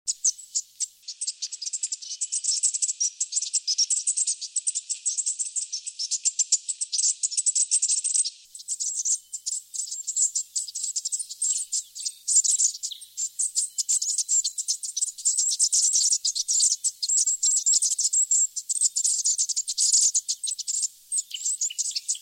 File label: striated Thornbill